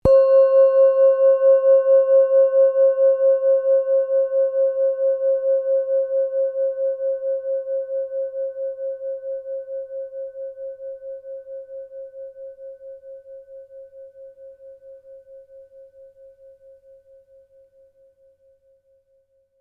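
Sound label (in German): klangschale jahr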